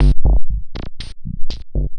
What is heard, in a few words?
Processed Perc